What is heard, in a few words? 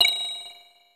Ding; Super Smash Bros